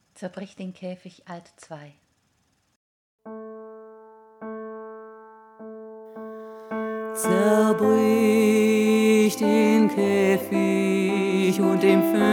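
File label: zerbrich den käfig, alto 2, German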